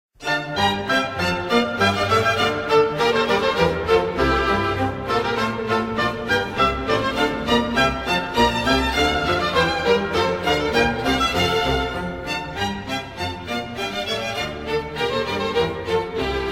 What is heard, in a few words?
Music for the Royal Fireworks Bourree; Georg-Friedrich Haendel